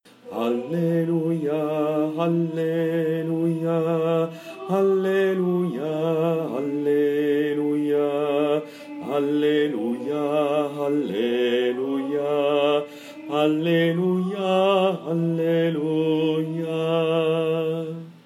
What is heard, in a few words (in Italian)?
alleluia contralti